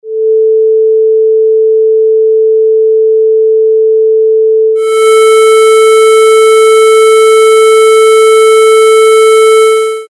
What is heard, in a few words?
distortion, Amplifiers